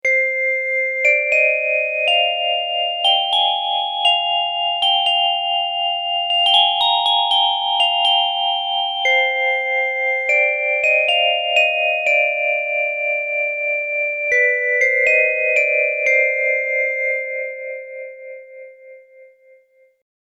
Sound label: Bells; Xerxes